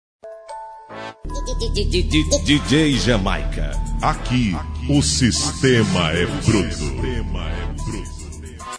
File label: rap